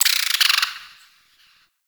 forest distant medium creature tree small call; Ambience; Warframe; Grineer Forest

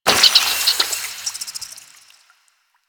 Infested Flower Burst; Warframe; Ambience; Nineteen Ninety Nine

